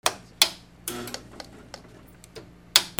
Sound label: CAMERAS